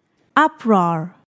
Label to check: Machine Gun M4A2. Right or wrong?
Wrong